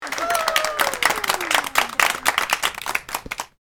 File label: House party people applause